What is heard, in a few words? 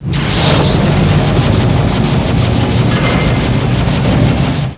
die; valve